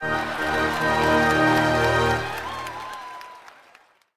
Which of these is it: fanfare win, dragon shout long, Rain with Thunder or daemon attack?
fanfare win